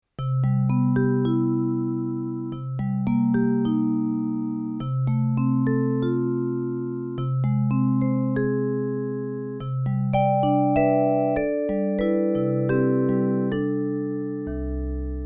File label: vibes